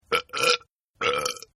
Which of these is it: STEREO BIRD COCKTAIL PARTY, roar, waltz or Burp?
Burp